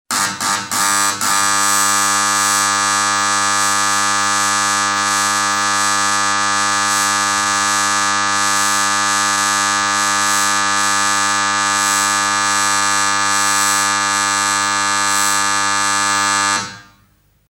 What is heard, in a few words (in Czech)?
bzucak